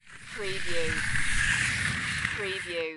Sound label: Skiing, Snowboarding, Downhill, slide by slower